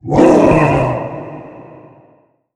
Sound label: monster; MonsterVoiceお手軽パック